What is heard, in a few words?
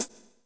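hi-hat, drums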